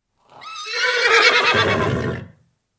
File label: horse noise